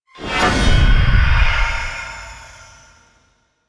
Horror Stinger; Warframe; Harrow

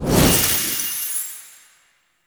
Horse Racing Portal Touch; Warframe; Duviri

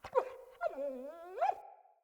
Pack Wolf Idle Grunt; Animals

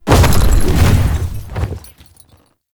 Horse Glide Start; Warframe; Duviri Horse; Vehicles

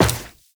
Run Metal, footsteps; Warframe; Tenno

